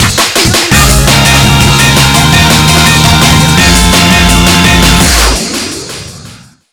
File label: Groove Rush Final Boss Clear; Sonic Rush OST